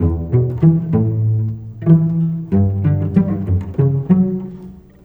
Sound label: Cello, Orchestral, Rock Pop; Sonic Foundry (Sony Creative Software); Orchestral Series VOL-4; Rock and Pop; Rock-Pop 04